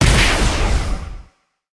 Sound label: Cost Damage